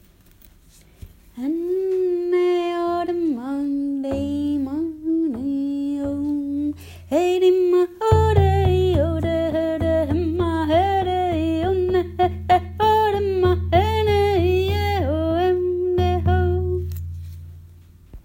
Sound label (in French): Tambour et voix